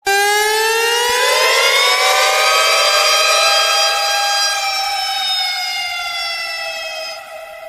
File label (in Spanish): sirena